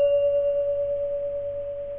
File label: glass